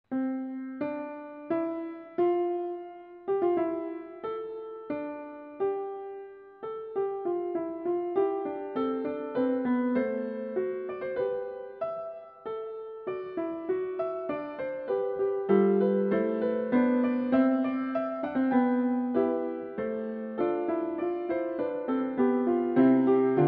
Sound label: Bach Fuga C-dur